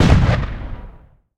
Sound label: enemy fire; rage of the seas